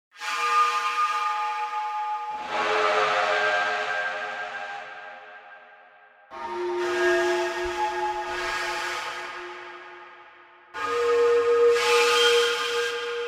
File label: Handlebar Blow Pipes